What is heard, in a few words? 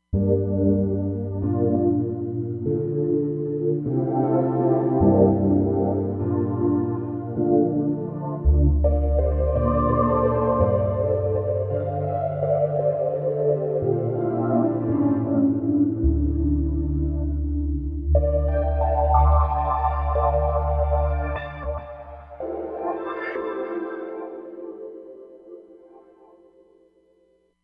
Warm Reso Pad, Roland Fantom XR